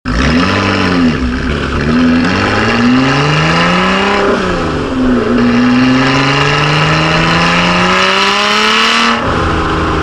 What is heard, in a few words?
Car speed